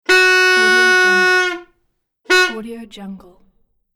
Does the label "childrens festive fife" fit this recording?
Yes